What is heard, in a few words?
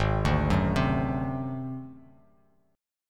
Ab 7th Flat 5th Ab down Bass Standard; Ab7b5 arpeggiated; JGuitar